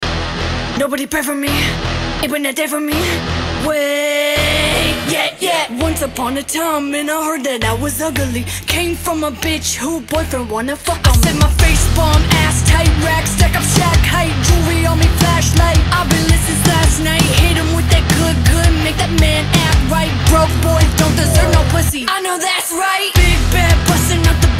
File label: (Rock Cover)